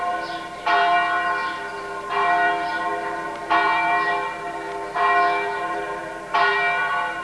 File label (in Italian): campa, San Giovanni in Galdo